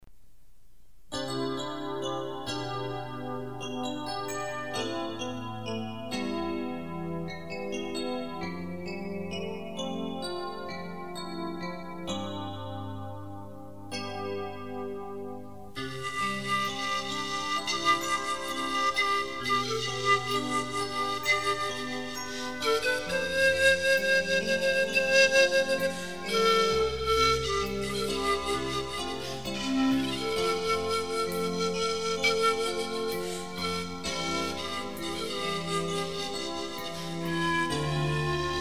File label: Panpipes